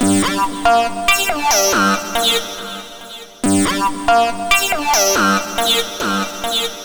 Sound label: HiFi, Techno Melody; TechnoMusicMaker